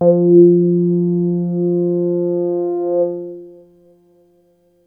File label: bass F2